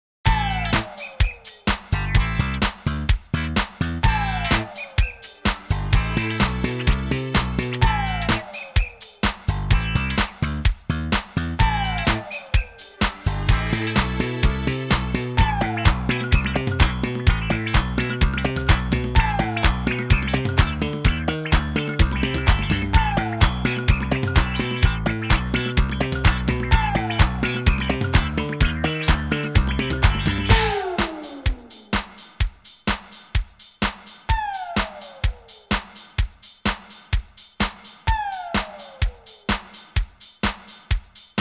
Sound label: Disco Hertz Spot